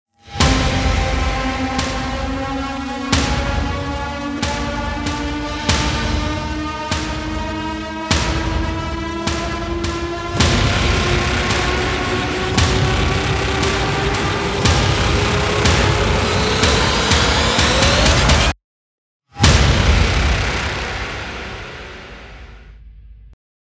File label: Tesseract No Fast Perc; Ninja Tracks